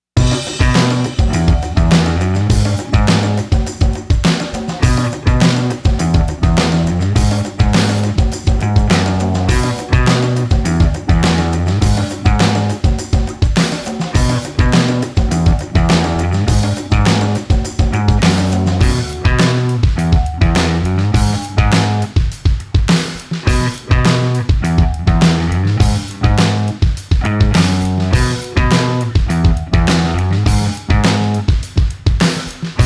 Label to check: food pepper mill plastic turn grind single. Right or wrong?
Wrong